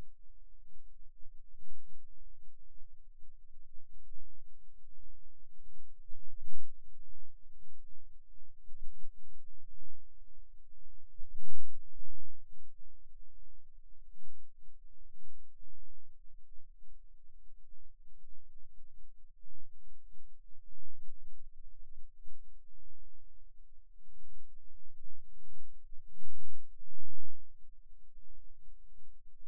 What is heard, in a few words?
Rhythm Wave, eeg seizures